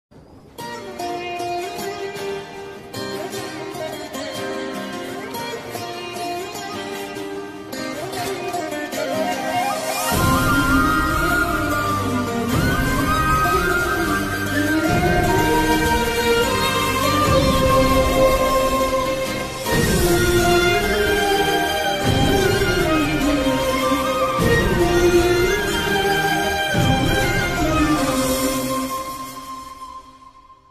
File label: tamil love ringtone love ringtone; raja rani